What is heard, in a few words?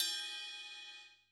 Ride; drum